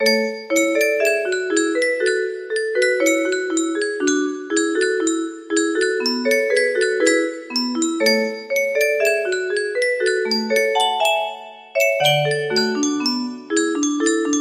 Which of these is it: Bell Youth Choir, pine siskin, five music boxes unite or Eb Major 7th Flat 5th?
five music boxes unite